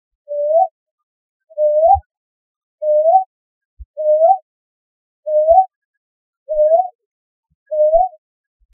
brown hawk owl Ninox scutulata, Thekkady Kerala short